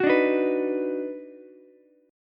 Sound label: D sharp Minor Major 7th Sharp 5th D sharp down Ukulele; strummed; chord